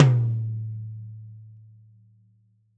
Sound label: large room tom drum a key